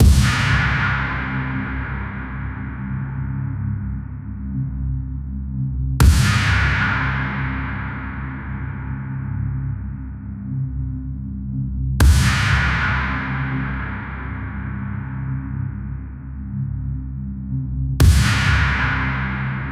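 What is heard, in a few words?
a balanced rhythmic mind m, experimental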